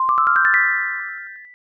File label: rainbow